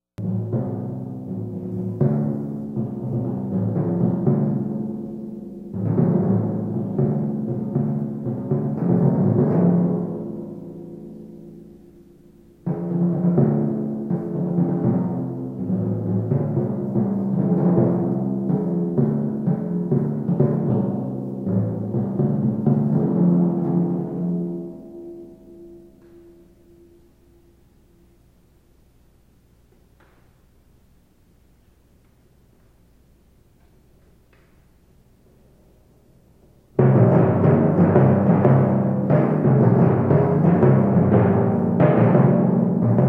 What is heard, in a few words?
timpani; ILMEA